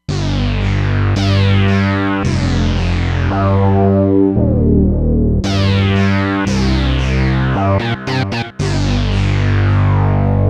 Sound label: Big Pedal, Roland Fantom XR